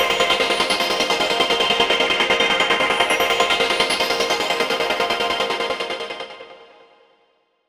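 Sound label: synth pad 150 C minor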